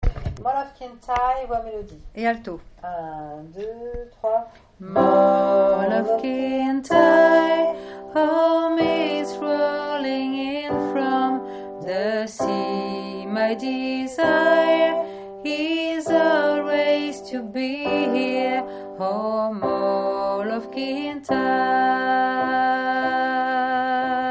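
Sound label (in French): voix alto et fond melodie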